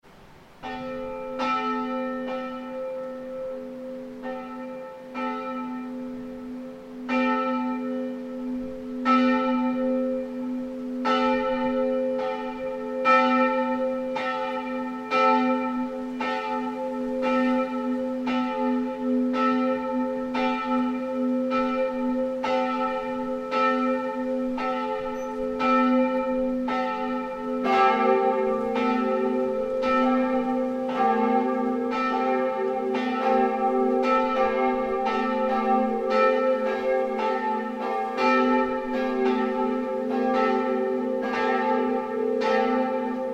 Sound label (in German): Glocken